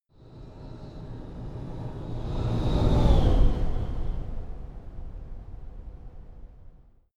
sci-fi vehicle train passing long; raumschiff

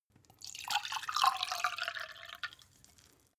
glass pour; soundpool

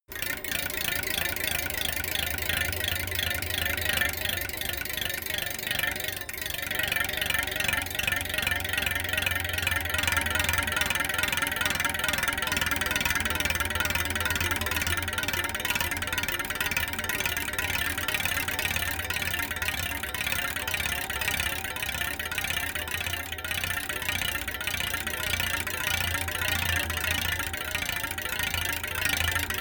bicycle spin wheel spoke rattle; fahrrad; verkehr transport

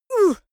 female combat hit soft

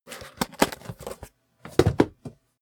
box cardboard small open; objekte

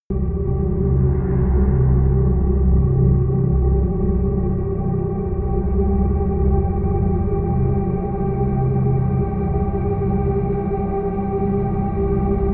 drone tactical

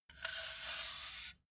metal pole slide

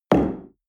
espresso place tablecloth

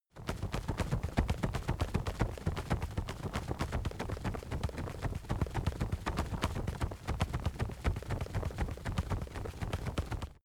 wing flap leather hectic, voegel; natur tiere

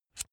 electric drill bit remove; maschinen; bohrer; soundpool

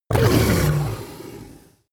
coloss groan pain; kreaturen

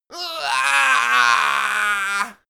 imp die; schreie

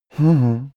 male deny mhmh; soundpool

